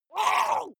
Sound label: water monster female gargle groan pain short; comedy-cartoon; soundpool